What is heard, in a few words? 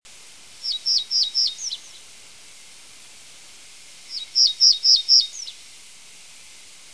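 reed bunting voice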